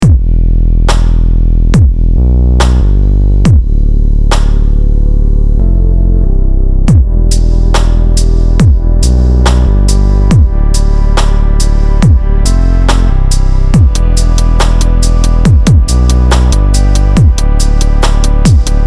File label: melody